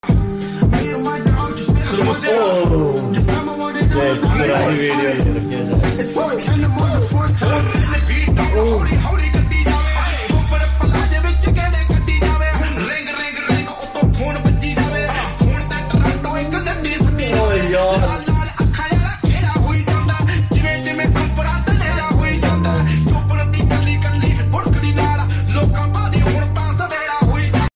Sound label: song hiphop; legend